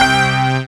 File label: SYN DANCE; Sound & Vision - Gigapack II